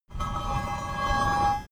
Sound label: massive metal scrape